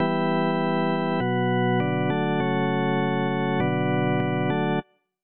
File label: Normal Loop Organ; sprunki incredibox